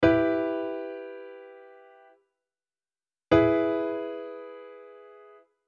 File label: chord E and Em